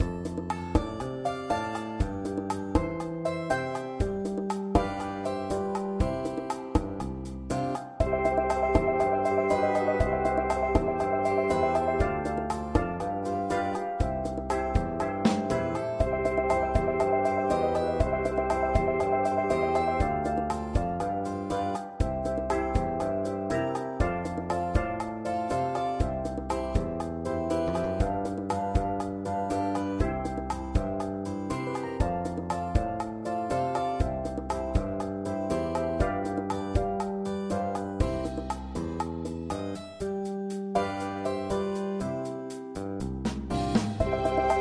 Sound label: FL kar, Flute